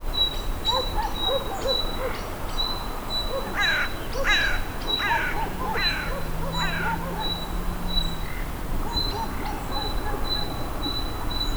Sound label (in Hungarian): dolmányos varjú riasztóhangja is, fülemüle; termeszetben; poroszlo